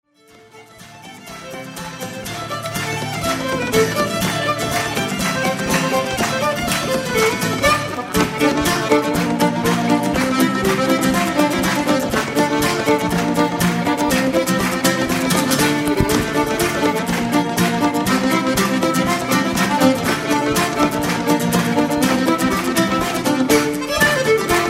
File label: Intrumental live